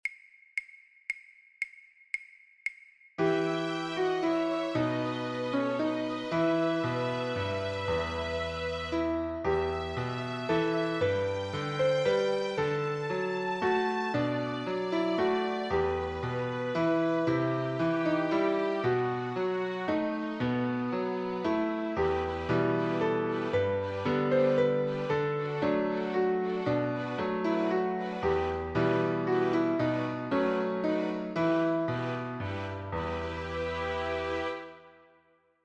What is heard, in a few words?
Greensleeves traditional Piano